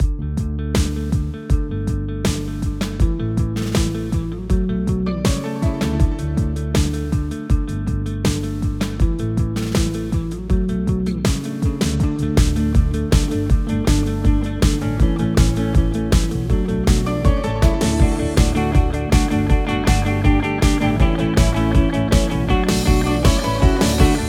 Rock

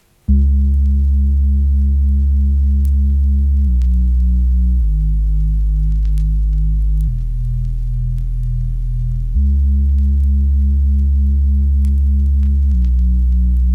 Instrumental

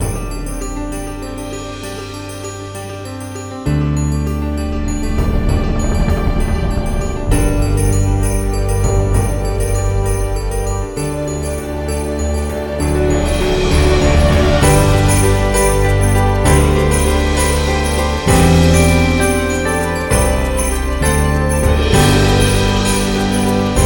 Good Tidings Orchestral

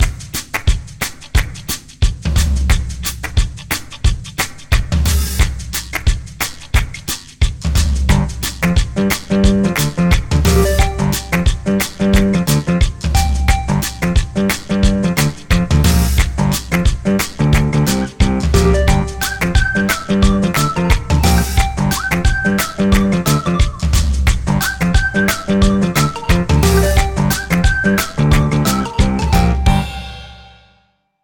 Spring Chicken Funky

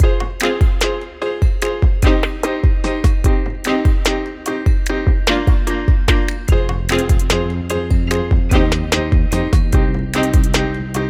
Beat

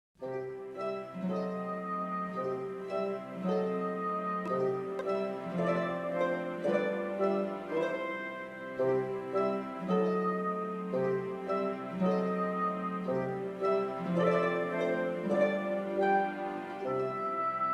Melodrama

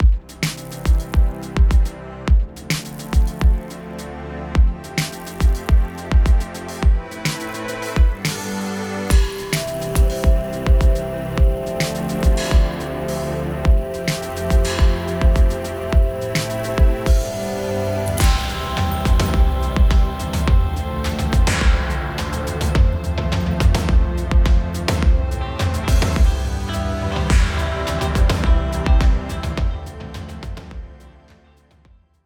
Instrumental

